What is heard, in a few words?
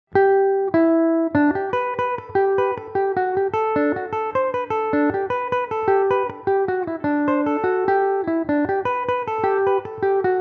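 G major belmuse, irish mandolin; Belmuse EM-190 electric mandolin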